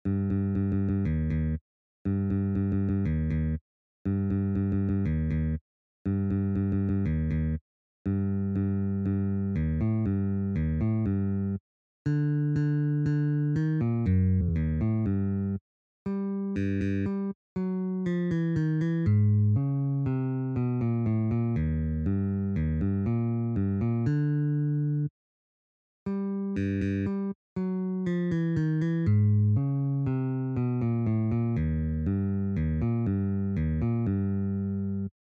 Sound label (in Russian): na bas gitare